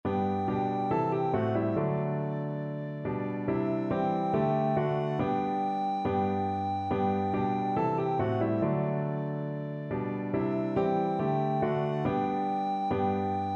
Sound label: ding dong, recorder